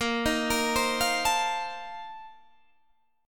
Bb Major 7th Suspended 2nd Suspended 4th, Guitar, Standard; 18,18,20,17,18,17; arpeggiated